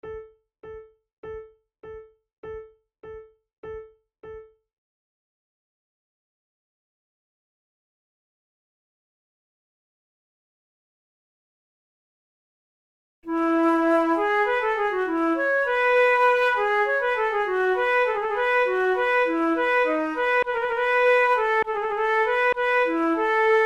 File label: concerts for two flutes fugue; Monteclair; flute tunes